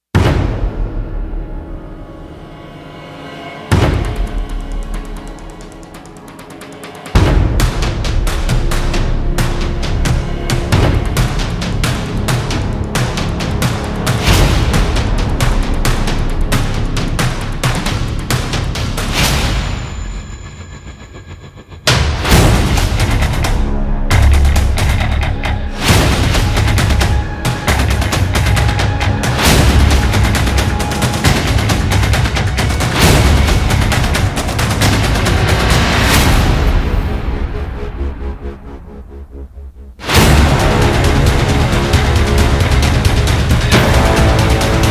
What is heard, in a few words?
No Piano No Rise; Ninja Tracks